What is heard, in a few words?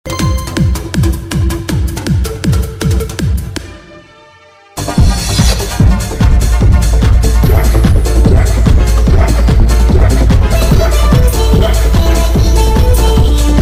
speed up reverb